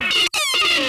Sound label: claw strike; svencoop; sence; source of life